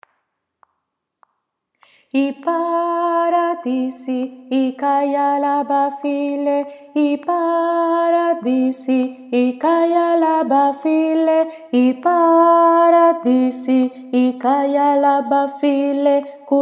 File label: alto